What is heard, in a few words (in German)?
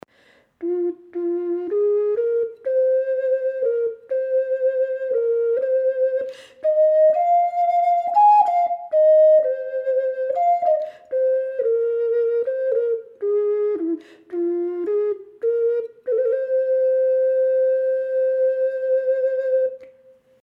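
Indianisch F, Indianische Ocarinas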